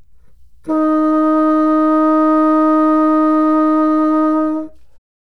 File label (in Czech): fagot